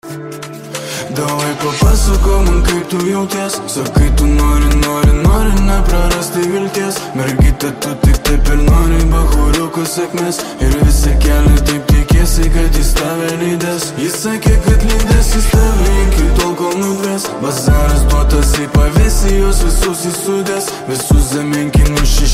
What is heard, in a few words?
MIXED Trap Drill; RSD Studios